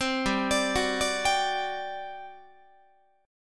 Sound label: G Major 7th Suspended 4th, Guitar; GM7sus4 arpeggiated